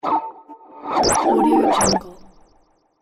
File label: game magic spell effect cast